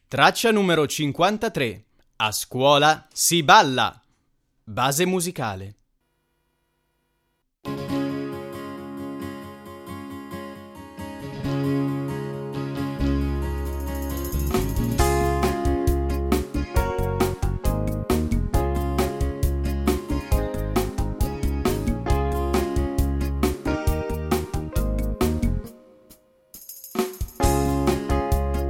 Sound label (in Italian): base musicale; infanzia